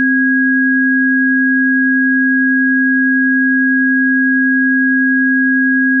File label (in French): sine